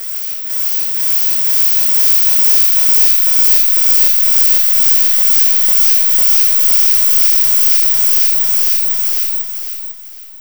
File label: gas leak; HL1-Mecklenburg